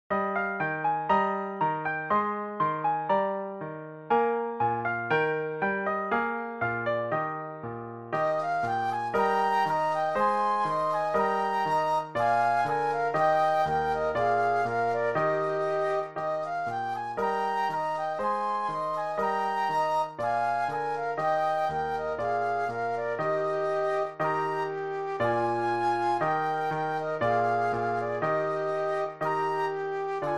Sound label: Burlesque Flute Duet and Piano; classical; Mozart L.